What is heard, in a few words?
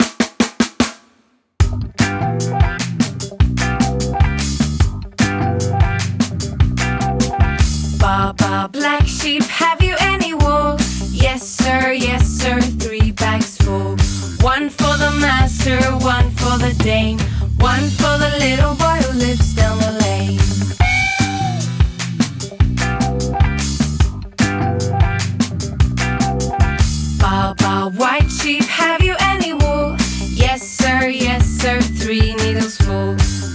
Funk Version